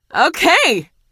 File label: car laughing; Portal 2; Source Engine; video games; npc